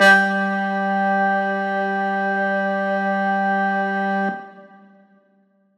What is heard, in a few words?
Riddim Synth Hit G; One Shots; Ghosthack; Riddim Essentials 2020